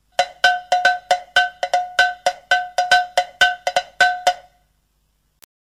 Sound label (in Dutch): Metaal koebel